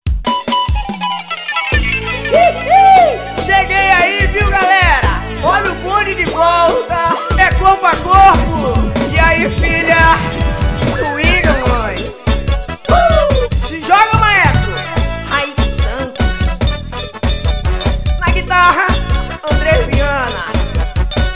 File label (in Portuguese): abertura com efeito